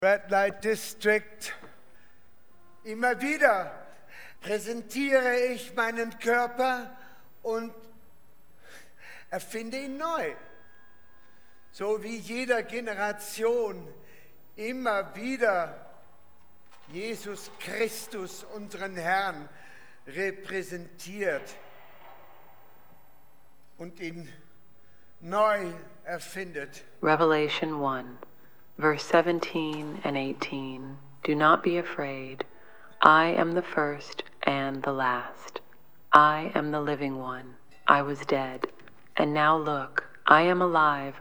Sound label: Voodoo Waltz